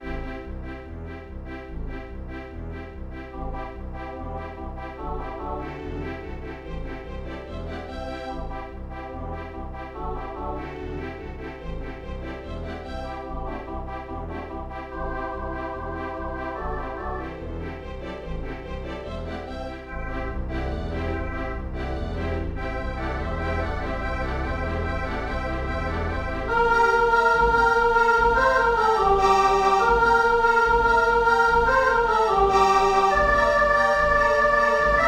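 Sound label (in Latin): veni venias, soprano 2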